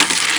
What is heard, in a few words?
bail board, skater; bails; Tony Hawk's Project 8; XBOX